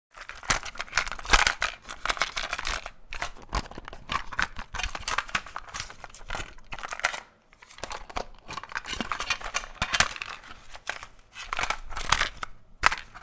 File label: Cassette tape handling rattle close perspective